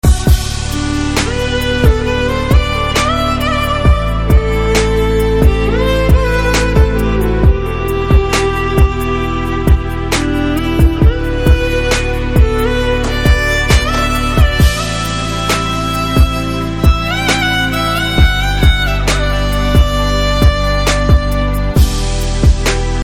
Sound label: BGM Telugu Ringtone